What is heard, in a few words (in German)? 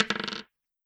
Holzwürfel auf Holztisch